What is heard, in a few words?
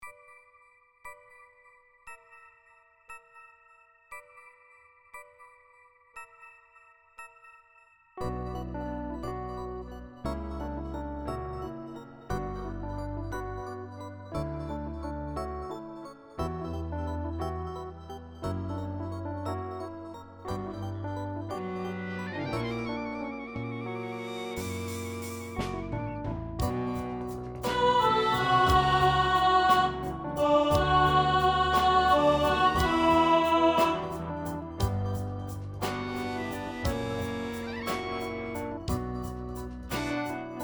Soprano Alto, rehearsal